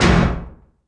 POWER KITS, DOOR; 90 sSampleCDs; Crypton - DrumBase5000

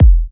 E kicks; markus hakala kicks

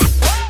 BD fx, industrial loops; USB Soundscan vol.24; AKAI

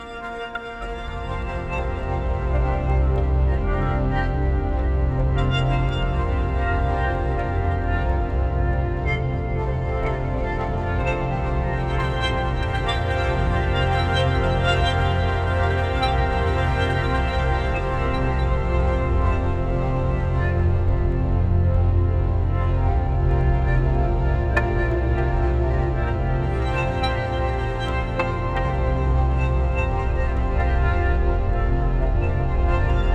F maj; M8

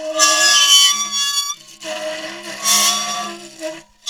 ronk, science fiction

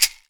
CAXAII, Definitive Percussion

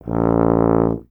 Tuba, Solo Instruments; Miroslav Vitous - Solo Instruments VOL-2

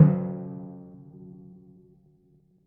F#2 p, Timpani, piano; PowerFX; Downtown Orchestra; scales

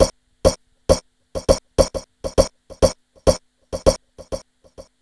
FX LP, Grunge Hip Hop; USB Soundscan vol.34; AKAI; RNB 101

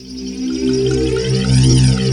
SOUNDTRAX; Greytsounds VOL-1; SYNCLAV TRAX

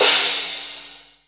CRASH CYMBAL

